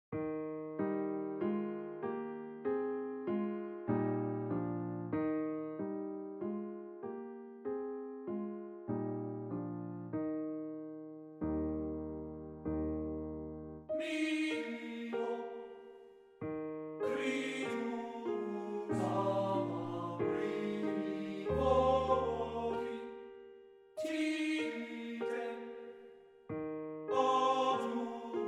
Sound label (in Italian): Lied per Canto e Pianoforte, Adagio; Rosetti; Das Lamm